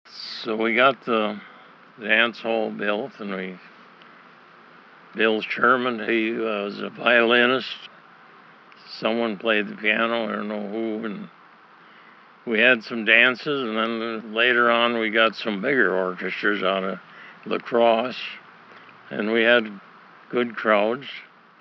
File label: Dance Hall Music